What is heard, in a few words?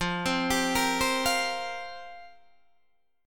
F Suspended 2nd Suspended 4th F down Guitar Open D